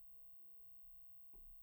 C Bass DI

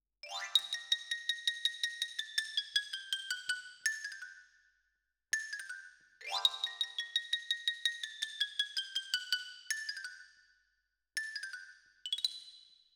Xylo

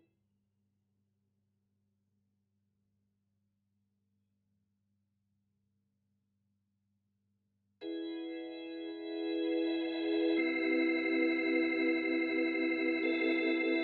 Hammond

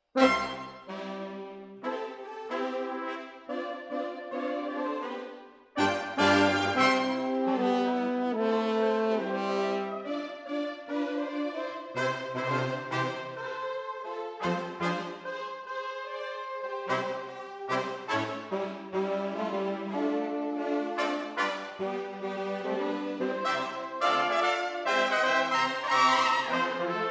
Horns Over

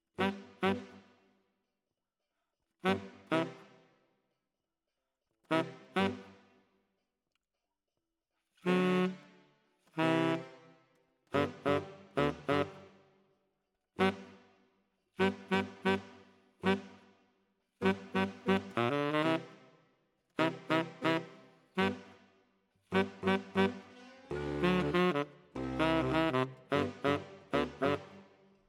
Tenor, RNR for piano

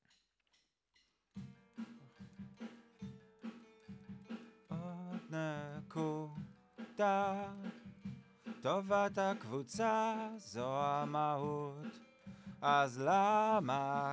Voc

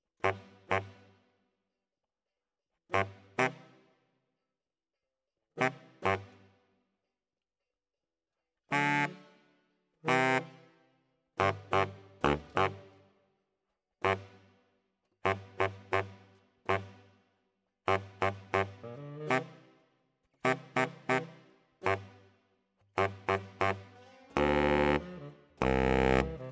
Bari, RNR for piano

